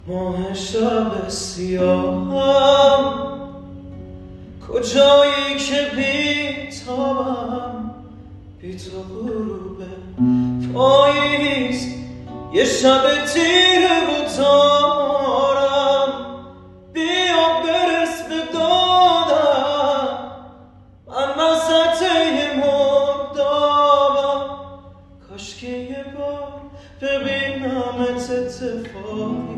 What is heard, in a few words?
Guitar